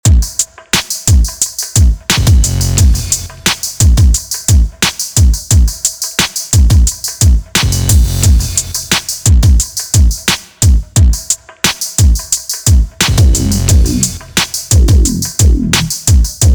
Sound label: 88bpm